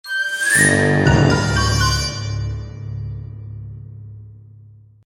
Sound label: Jingle Stupid Accident